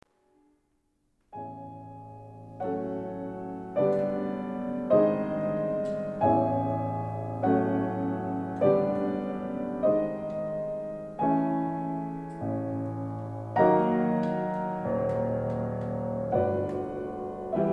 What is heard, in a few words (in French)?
cloches; debussi